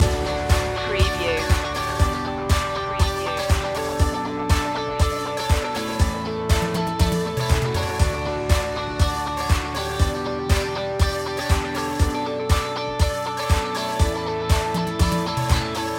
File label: my motivation loop